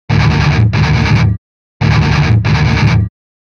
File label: power chord